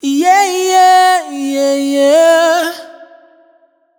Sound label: riff long yeah E wet, Vocal; Slate Digital Omnivox Vocal Sample Pack